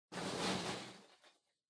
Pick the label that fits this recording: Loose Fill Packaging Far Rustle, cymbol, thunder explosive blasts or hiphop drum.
Loose Fill Packaging Far Rustle